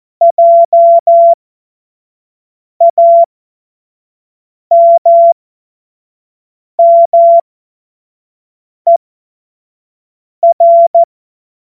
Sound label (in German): Witz, Morsekurs, 25 F70; Witze